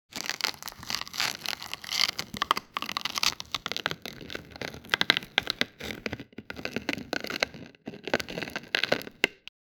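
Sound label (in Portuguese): áudio realista de empada, pie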